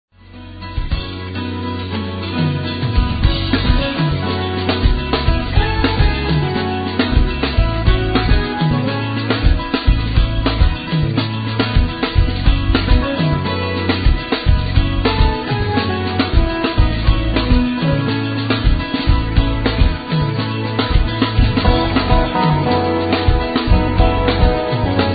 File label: music; directional sound